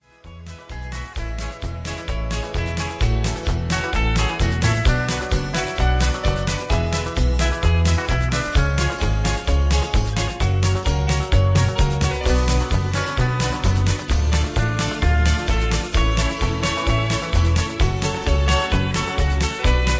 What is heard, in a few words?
Instrumental with Less Melody; Singing Calls